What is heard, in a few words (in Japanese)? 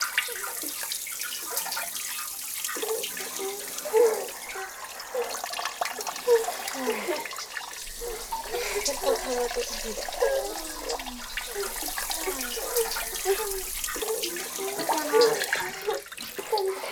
トイレ 同時 ループ; おしっこのみと編集版